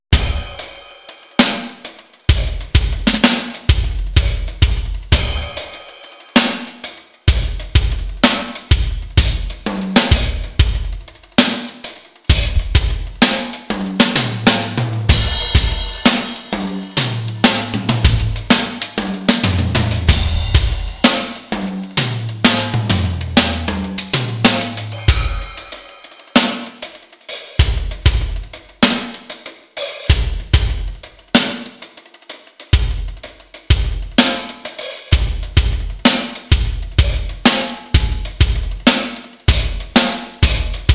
verb test Live Drum Room

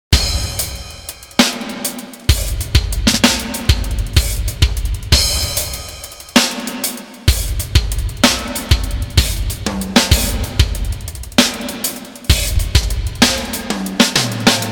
verb test X large hall

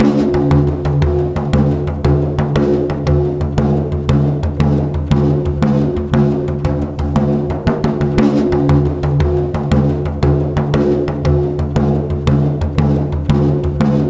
bodhrán 88 bpm

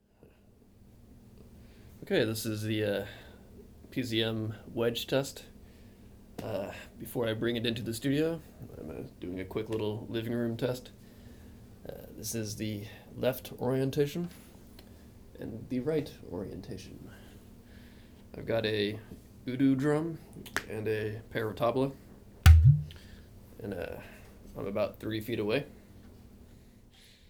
PZM wedge test; Daily Noize; Wahrenbrock PZM Microphones

